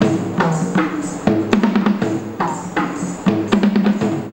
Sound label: PERC, India; Best Service; Ethnic Journey Sound Cube